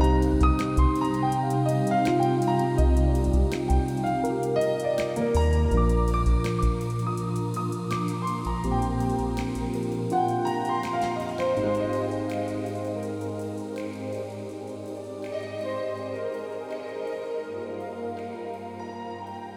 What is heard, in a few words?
Evaporating Piano; BB 2HTC Samples Vol 4; Pads